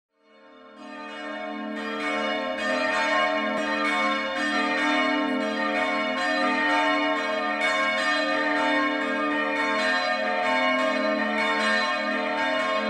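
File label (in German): Tonaufnahme a1 c2 d2, mehrstimmige geläute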